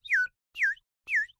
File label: Bird whistle, clay, painted, water, airy, wispy, burst, 3 chirps; Eiravaein Works; Vaeyan IV